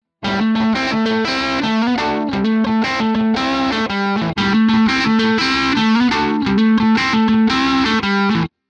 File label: band stop filter; orinj